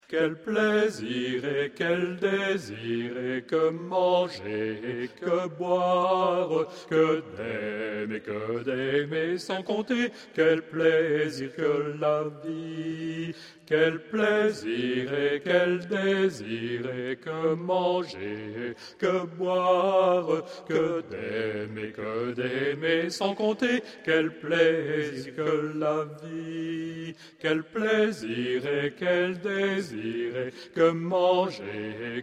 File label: Alto; les 4 voix ensemble